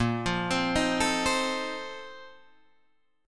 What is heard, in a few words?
Bb Major 7th Suspended 2nd Suspended 4th, Guitar, Drop D; BbM7sus2sus4 arpeggiated; JGuitar